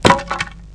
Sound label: drop plank